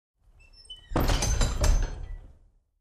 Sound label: Truck Creaky Back Doors closing